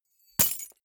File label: key ring large drop carpet hard